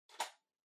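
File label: Portable Cassette Far Fast forward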